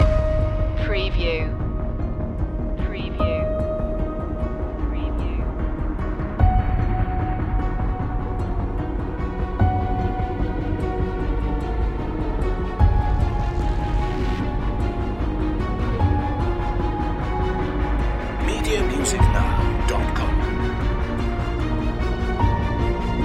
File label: dark power loop